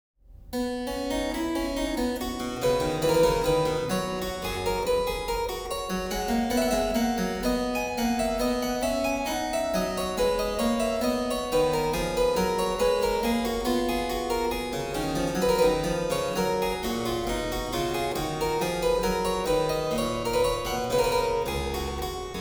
Zweistimmige Inventionen in C major, Keyboard Works; BWV 772; J.S. Bach; Inventionen & Sinfonias